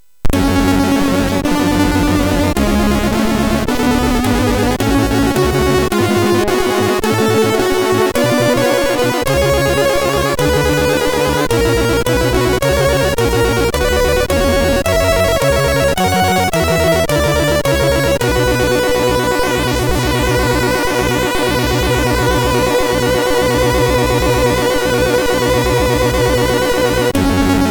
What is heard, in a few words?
Classical, MOS6581